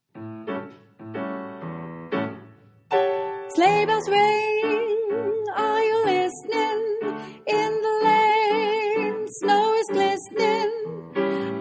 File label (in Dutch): Walking 2e sopraan